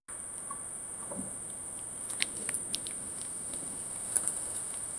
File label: the baby monkey and chick; animation